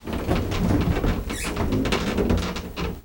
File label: Swamp Boat Groan; the princess and the frog